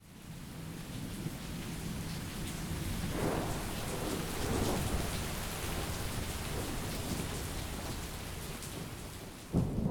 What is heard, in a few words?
Rain